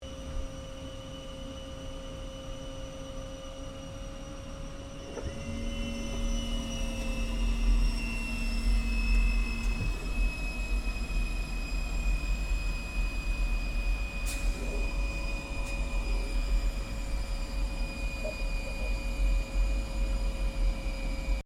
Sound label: Aircraft 1947 Gloster Meteor F Mk8 Canopy Open Interior, Gloster Meteor fighter jet; Airplanes